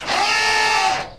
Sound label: hydraulic; gmod; lfs luna s flight school; laatc atte